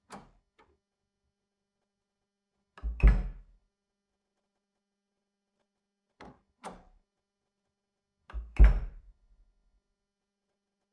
wooden door close, Wooden door close, realistic foley, medium force, hallway space; 0.8s; Фоли для кино, сериалов, narrative-игр